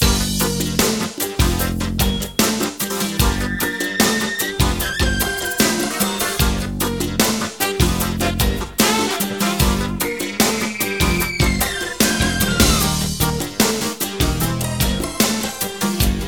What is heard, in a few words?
funky